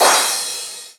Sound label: Crash, Cymbals